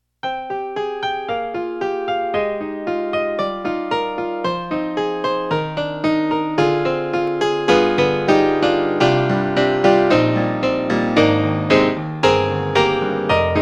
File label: KORG NX5R Piano2